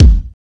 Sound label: 80s Drum Kick; Yamaha PSR E305